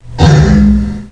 Bang; Tristan

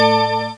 Push Button

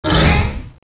magic

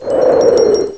COLD; mordor

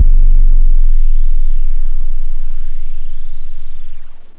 sub base, bass, synth; da capo vol1; instruments

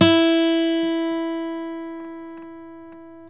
E2 STR, GUITAR TUNNING

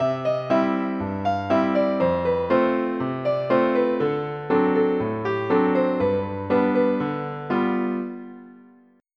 RAGTIME; EuroCD 3

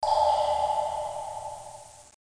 drip

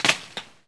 Ball Branch; Tiger Woods

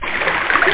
WATER; artistix

